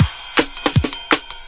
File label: 161 bpm the break